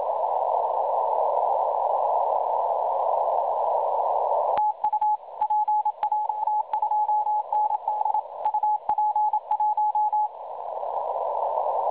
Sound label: CW 3521.1kHz; ham